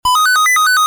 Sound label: Sms Tunes